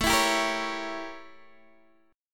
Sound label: A 7th Flat 9th Guitar Open D